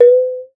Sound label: water; Xsample VOL-9